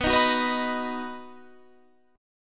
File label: B-Major B down Guitar Standard; strummed; capo=2